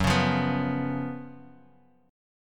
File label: F sharp Major 7th Suspended 4th, Guitar Drop D; chordshape; down